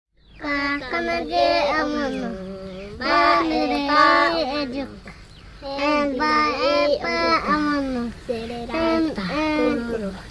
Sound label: Kosmofonia Mbya